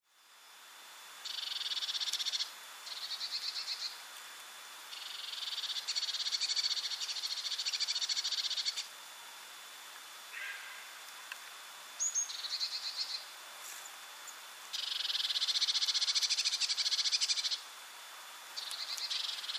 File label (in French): mesange bleue appel